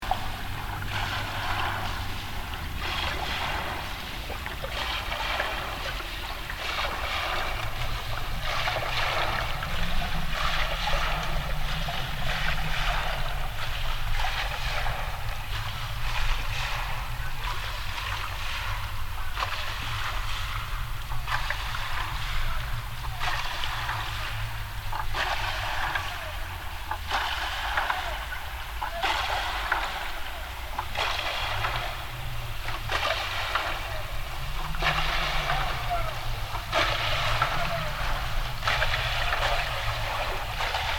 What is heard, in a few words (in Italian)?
Remi in acqua